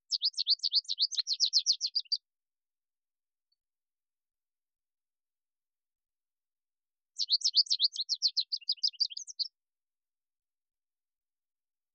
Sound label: American Goldfinch